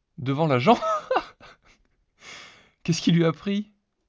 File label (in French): rire naturel